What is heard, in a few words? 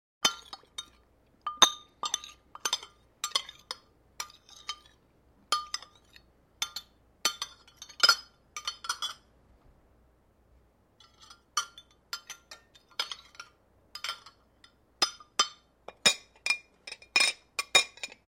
Spoon Clinks & Scraping Bowl For Eating or Serving Food; Human Sounds